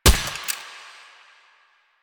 Shot Initial Mech, Weapons; Warframe; Acceltra Prime

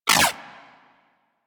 Shot, Weapons; Warframe; Moa Pet; Tazicor

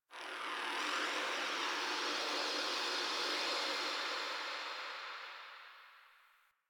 Landscape Wind Atmosphere Anomaly, Ambience; Warframe; Venus Open World; One Offs

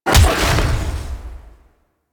Melee Jump Short Slam Impact; Weapons; Whooshes; Warframe

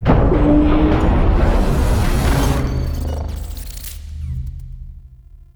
Connector Power On; ambience; Warframe; Entrati

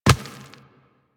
Walk Rock, Footsteps; Warframe; Operator

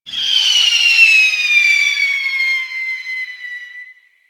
Spam Projectile Whizz

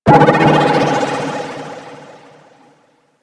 Space Satellite Sonar Ping; Warframe; Ambience; Space Battles

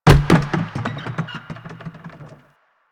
large plastic garbage bin

